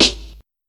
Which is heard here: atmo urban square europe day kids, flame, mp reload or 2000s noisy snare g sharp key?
2000s noisy snare g sharp key